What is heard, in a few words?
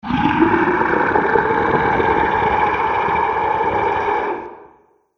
Dinosaur Creature; Dinosaur Sound Effects; animal